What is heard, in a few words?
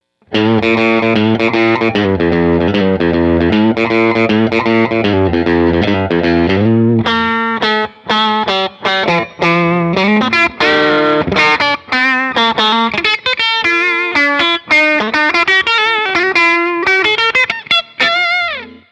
Tri-State Bridge, pickups